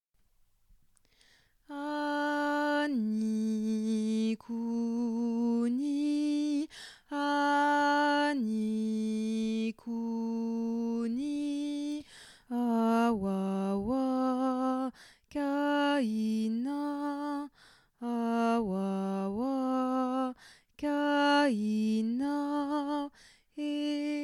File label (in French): basse; ani couni